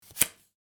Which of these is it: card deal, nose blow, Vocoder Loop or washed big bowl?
card deal